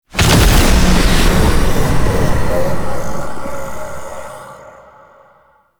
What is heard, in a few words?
Full Health Stinger; Enemies; Warframe; Entrati Doppelganger